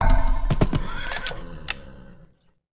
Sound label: Build Russian Cossack; Civ4 warlords